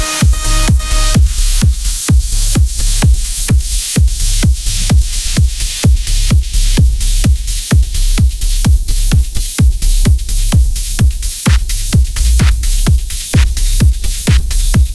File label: phonk bassline frenzy